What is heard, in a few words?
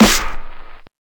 Roomy Acoustic Snare D Key; snare drum samples